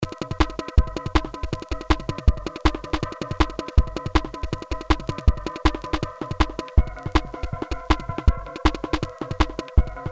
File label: 160 Rythm; Chuck's Zombie Petting Zoo; Marr0w Productions